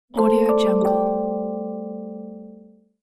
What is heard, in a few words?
music box magic ding; interface sounds